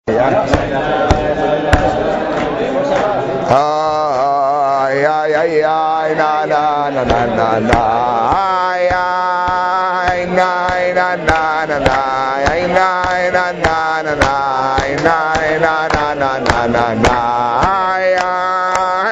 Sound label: nigun; ניגון; הרב מרדכי אלון; לימוד מגילת אסתר תשסח; purim